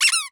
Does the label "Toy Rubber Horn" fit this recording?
Yes